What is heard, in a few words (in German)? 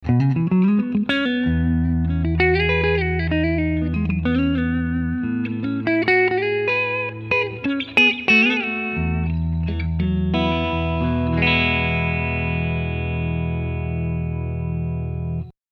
Clean Picking beide HB